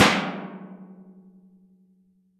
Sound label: CAN HIT; 1000fx